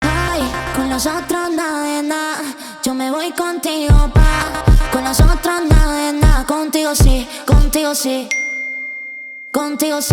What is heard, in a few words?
phonk